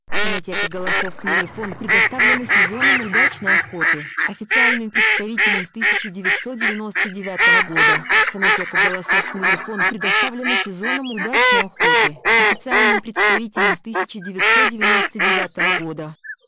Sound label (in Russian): кряква; plurifon